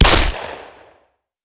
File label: glock17 single